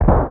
expl far start, explosion; World of Tanks Blitz

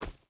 footstep hide forest run; Rust

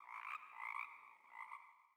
sting frog; Rust

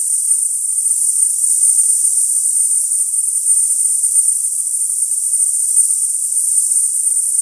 Aircrafts Engines UH1H Around Compressor; DCS

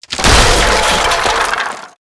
objects, World of Tanks

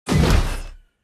torpedo; World Of Warships; weapons

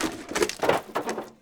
Inf Weapons Foley; Red Orchestra Ostfront 41-45

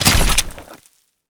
pull claw fast

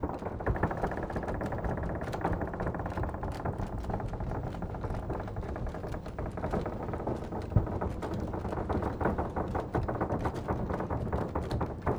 door large wood movement loop; Rust

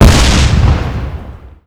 tower missile; Call of Duty Modern Warfare

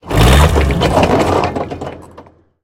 tank engine load; engines; War Thunder

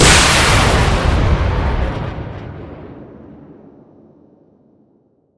Grad Firing; Weapons; DCS

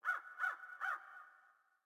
sting crow; Rust

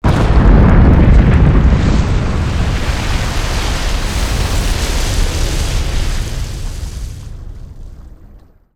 sinking road

